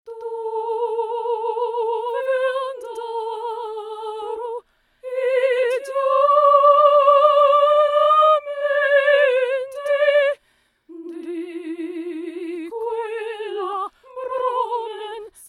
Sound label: Opera Double Voice